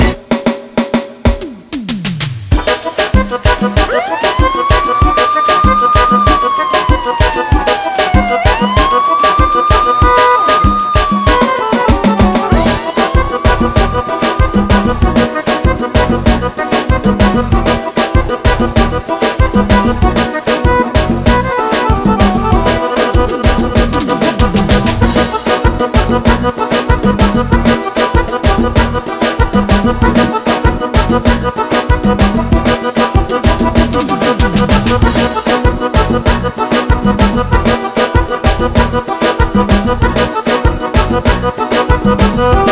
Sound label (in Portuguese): pisadinha intrumental